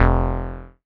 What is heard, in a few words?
bass F1, Oberheim OB-Xa